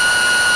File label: active, turret; cstrike